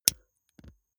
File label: lighter electric switch flame on go out